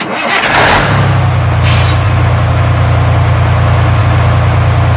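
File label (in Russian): грузовой автомобиль завелся и работает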